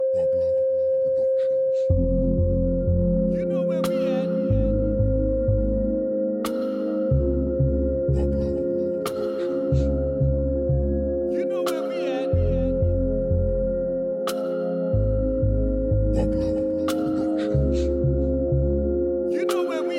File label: Reggae Dancehall